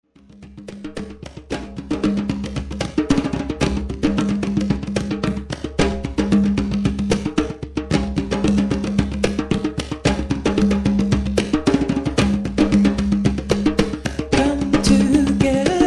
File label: drums